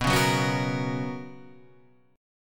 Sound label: B Major 7th Suspended 2nd Guitar Half Step Down, chord